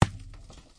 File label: snowball wood, Throwing Snowballs; Throwing Snowballs Kit